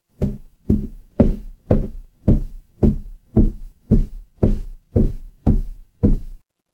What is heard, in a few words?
footsteps wood